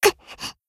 combo break

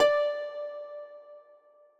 D6 harp

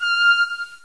pipe

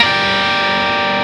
Arpeggio down N.H.

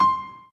Piano Chord

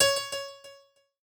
key C#

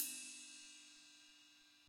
ride soft

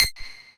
lead

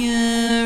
vocal

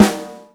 normal hit clap

